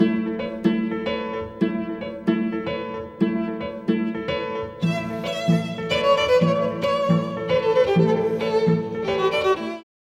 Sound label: Classical, in stereo